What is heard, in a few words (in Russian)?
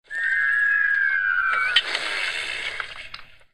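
люди издают звук бомбы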